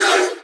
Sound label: sapper snap